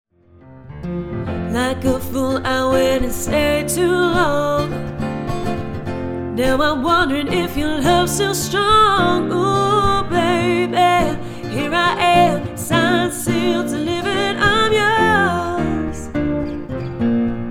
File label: Guitar